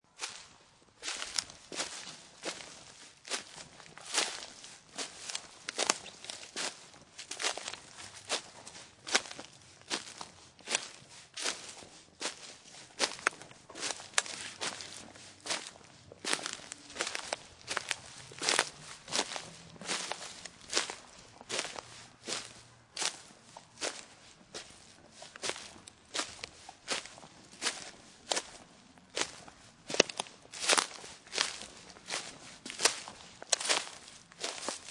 Footsteps Dry Forest; LynneMusic

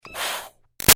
Steam Release Iron Chuff Muted; Miscellaneous Household Sound Effects; Big Room Sound

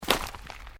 Footsteps Boots Volcanic Rock Step

